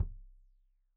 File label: Kik Stereo; Deeply Sampled Drums